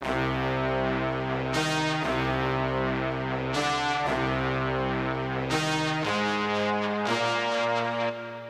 korg i3 brass; Korg i3 Test

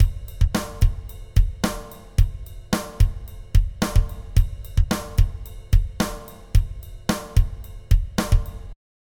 drums passive eq; softube passive-active pack testbericht

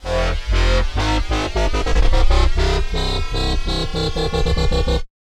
wobble; sonivox

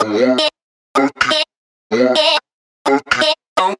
vocal 126 hyper; Wave Alchemy Loop Tools and Grooves

